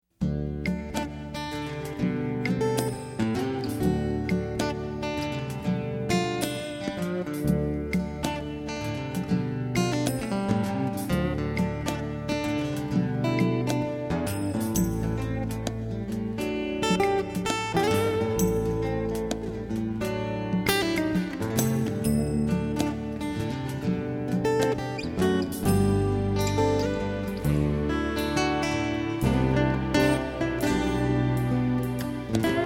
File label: Warm Country Blues